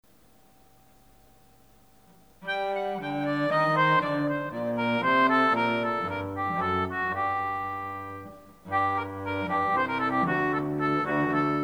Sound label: Hornpipe, concertina; Presbyterian Hornpipe